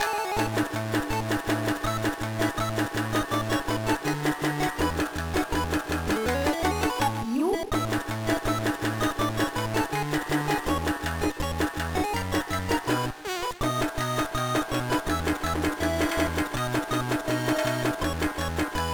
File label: BGM; dangerous jiisan naki no 1 kai zettaifukujuu violence kouchou wagahai ga 1 ban erainjai; gba